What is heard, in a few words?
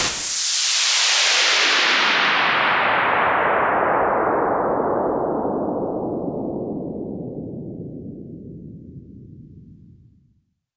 FX Sweep Down; vengeance essential club sounds-3